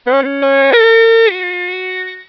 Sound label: yodel synth